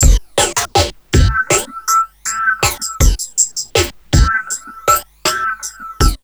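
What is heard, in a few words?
neuro, drum loops crazy processed, 80 bpm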